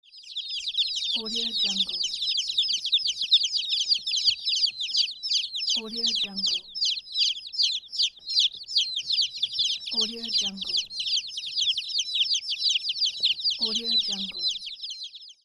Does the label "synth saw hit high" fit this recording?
No